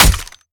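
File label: melee character gun large steel; viper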